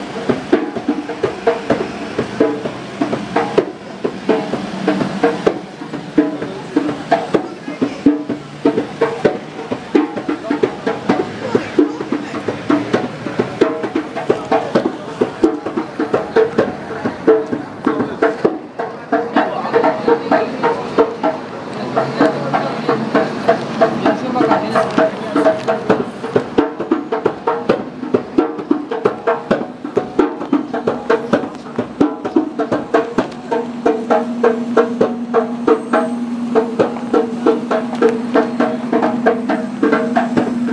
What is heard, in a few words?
djembe player busking outside tube; tottenham court road; on oxford st